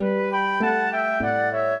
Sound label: minuet, flute harp; Mozart